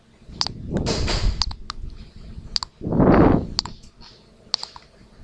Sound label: noise